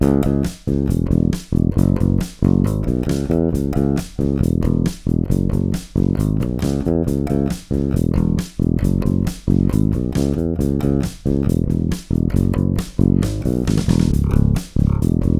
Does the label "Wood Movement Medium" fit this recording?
No